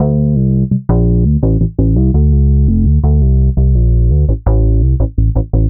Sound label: Synth Bass